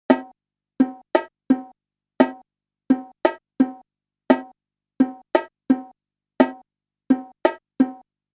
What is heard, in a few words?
4 repetitions of the previously unpracticed rhythm 3:2